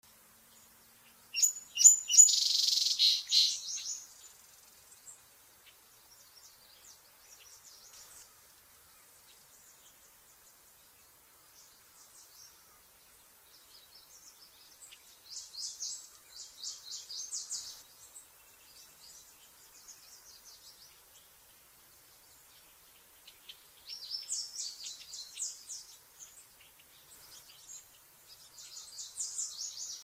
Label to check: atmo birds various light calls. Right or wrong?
Right